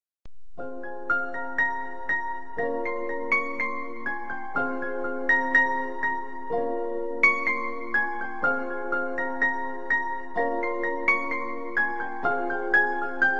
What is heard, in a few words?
tamil love bgm ringtone